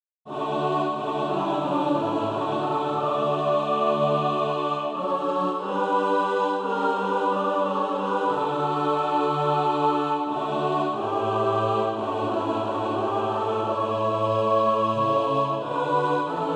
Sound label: mixed; A Sinner Saved by Grace